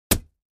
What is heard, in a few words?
Axe Paneling 1 Side, axe hits